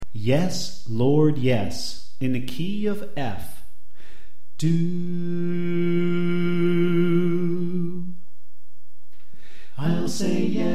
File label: Soprano Left